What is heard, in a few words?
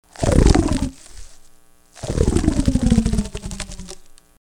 Robot Arm